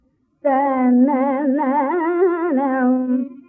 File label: Pantuvarali Shruti E